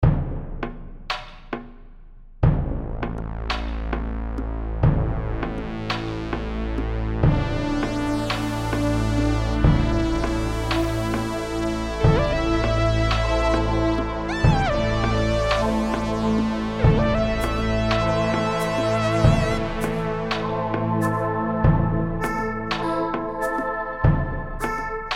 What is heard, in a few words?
classicsynth